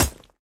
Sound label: Weapon Spear